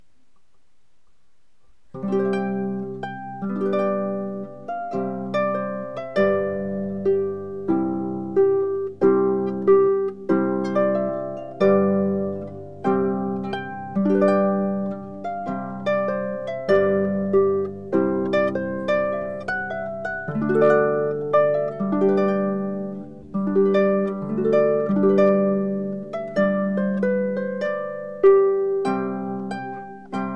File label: Harp Solo; Trumpet Tune; Purcell; Dominique Paliotta